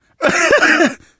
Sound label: laughter, survivor voice; source engine; left 4 dead 2; manager